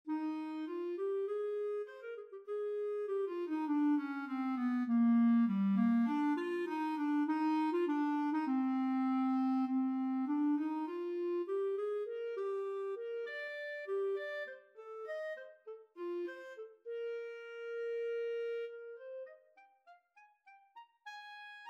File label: Clarinet; WW Auditions